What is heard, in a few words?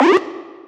Hybrid Fx